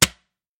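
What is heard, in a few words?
spear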